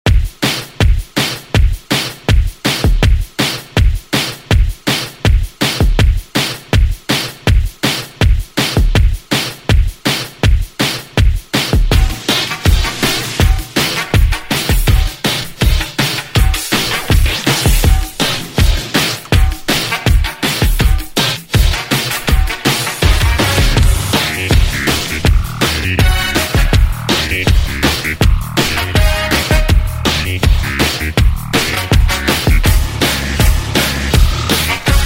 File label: 80s Redrum 162Bpm